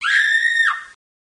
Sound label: scream; css; stickynades